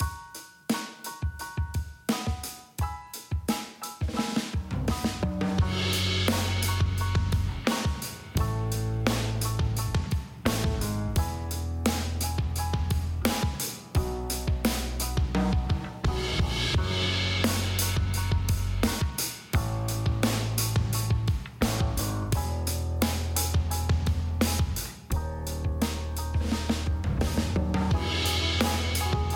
BG Music; CityVerse

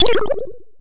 sounds falling; lows adventures

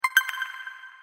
crash count

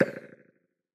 Bullet Puff

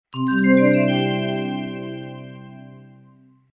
cashin

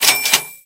upgrade

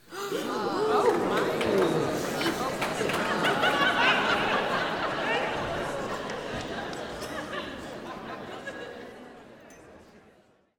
crowd Reaction theater big Reveal; spellbound

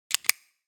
mail

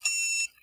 beep

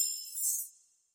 balloon bonus win

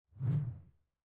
show pop up

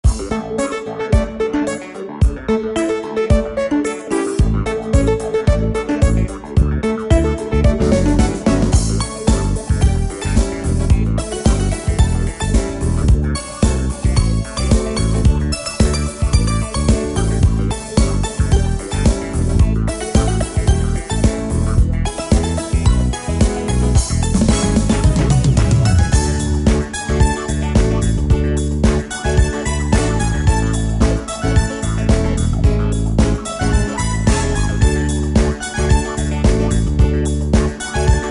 music; BombIt5

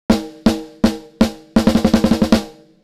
snare Snare Long; SSL X Gate

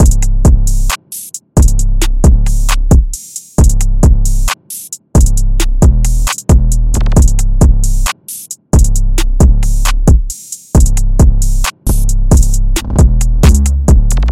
loop

